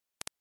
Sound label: 60ms ticks